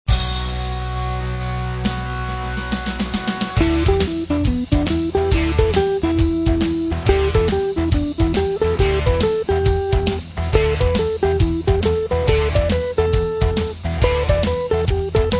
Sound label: Pattern7 Asc, Penta Blues Patterns